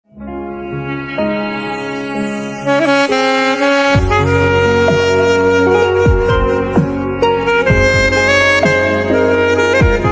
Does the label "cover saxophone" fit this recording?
Yes